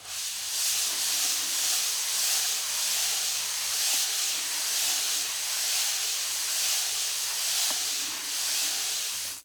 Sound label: Sponge; TheWorkRoom Audio Post; Cloths & Sponges Foley